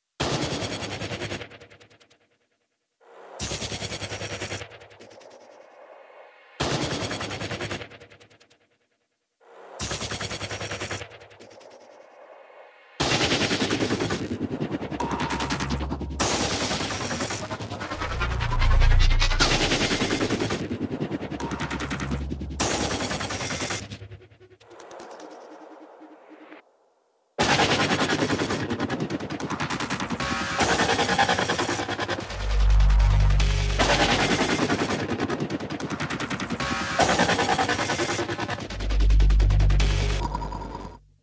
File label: phaser pulse